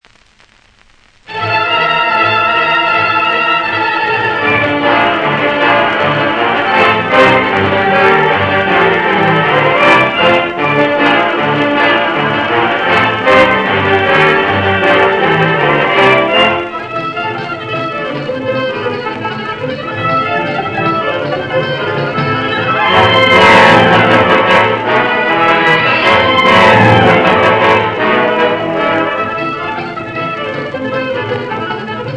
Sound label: Dance; Orchestral; HMV B-2981